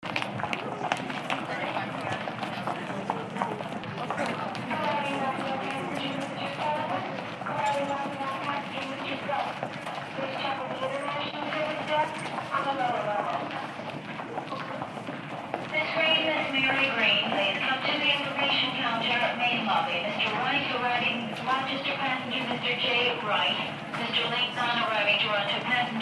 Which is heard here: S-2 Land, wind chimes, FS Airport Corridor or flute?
FS Airport Corridor